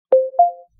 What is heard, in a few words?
smooth completed notify